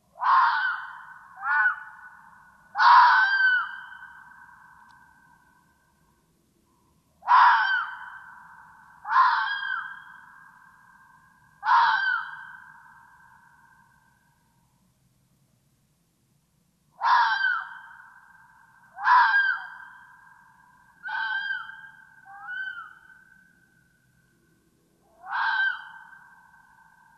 red fox screams

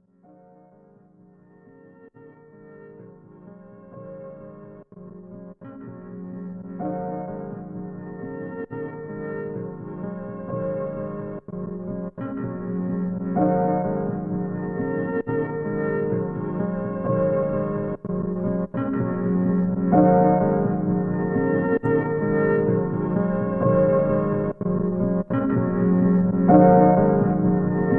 degraded piano loop

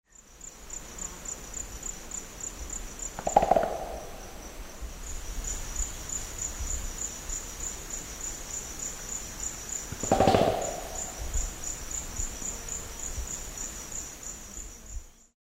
western lowland gorilla

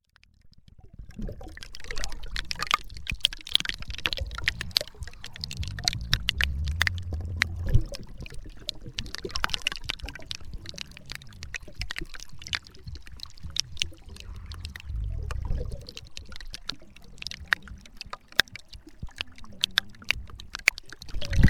extreme iceberg close up with moaning

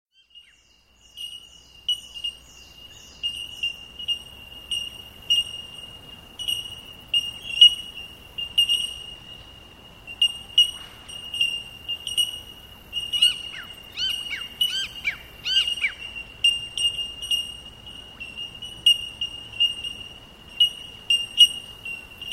bell birds

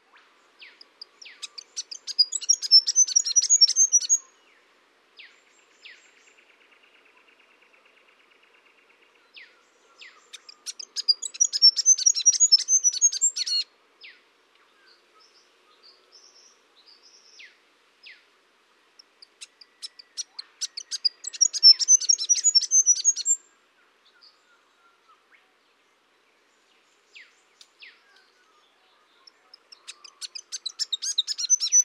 grey fantail normal

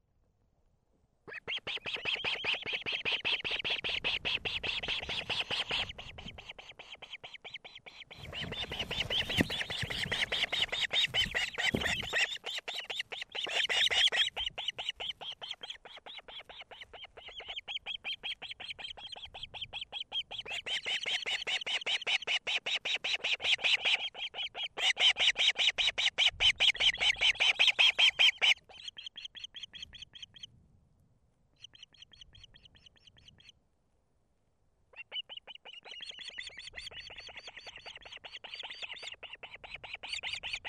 osprey chicks

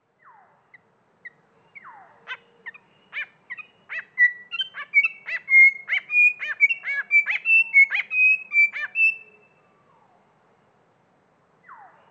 grey fantail slowed down

